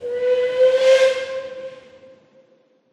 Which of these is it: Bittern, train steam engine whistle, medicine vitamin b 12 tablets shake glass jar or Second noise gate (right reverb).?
train steam engine whistle